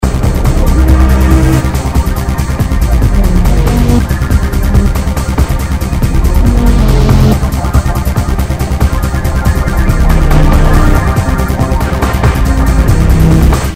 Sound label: loop